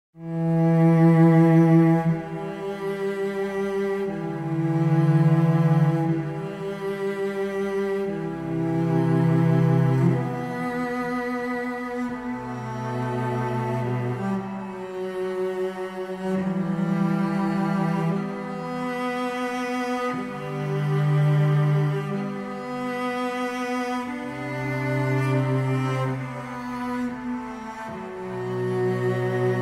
Love of Cello s Violin, Relaxing Music

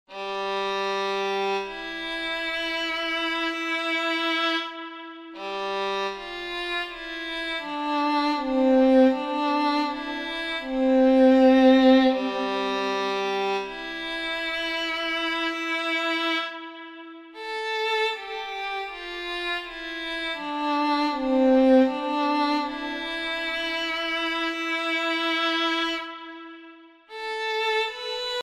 Violin Soul, Relaxing Music

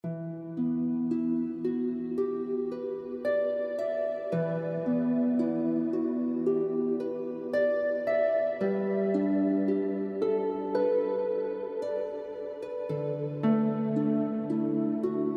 Touch The Soul Harp; Relaxing Music; heavenly harp music

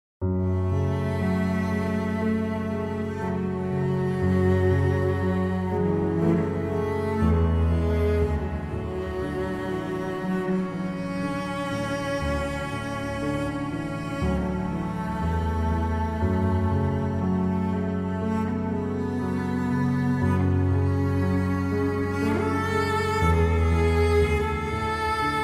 Cello's Love with Violin Support; Relaxing Music

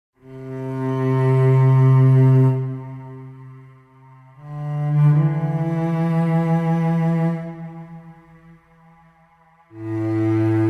Cello Uplifting the Violin; Relaxing Music

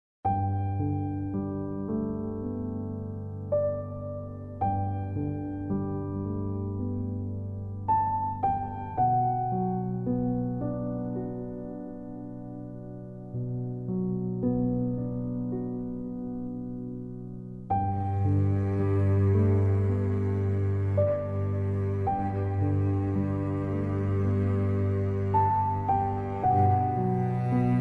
Soothing Piano and Strings; Relaxing Music

